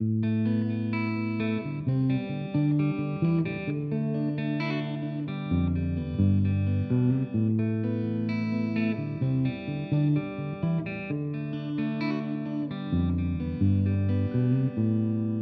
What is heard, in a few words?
harley benton cst-24 clean neck fender